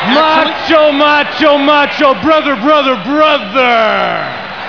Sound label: sting